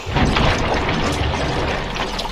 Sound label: dodge mud; Dark Souls III